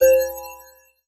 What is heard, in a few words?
Multimedia Internet CD-Rom Flash Beep Low Pitch